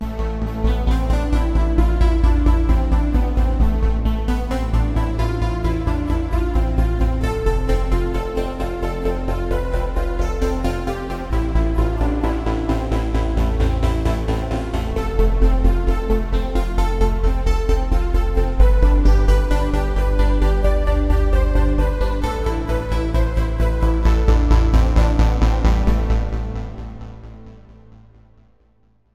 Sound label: Wavestation Gate; KORG OASYS; Wavestation Gate 2; oasys assault